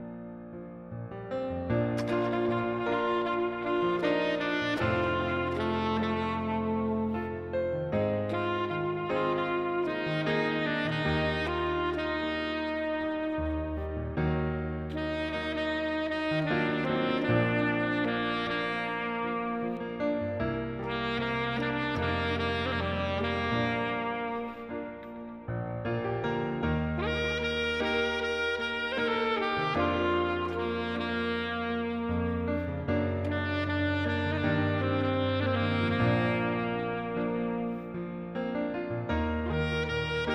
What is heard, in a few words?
sax